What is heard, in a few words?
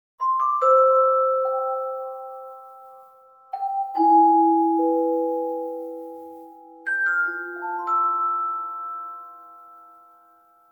Jingl; Shiju Hachi; PS2